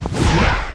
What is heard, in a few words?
Hit Swoosh